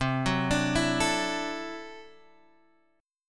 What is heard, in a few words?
D 9th C down Guitar Standard; arpeggiated; JGuitar